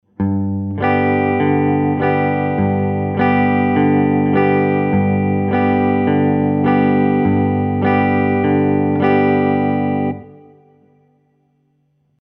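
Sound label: Basic Right Hand Strum Pattern, guitar; introductory guitar skills worksheets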